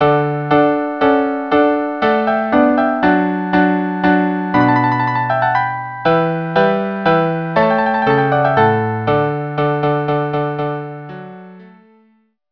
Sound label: minuet quartet; Water Music in D